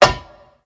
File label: Ladder; blue archive